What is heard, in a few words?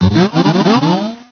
talk